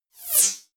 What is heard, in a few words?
sci-fi projectile fly by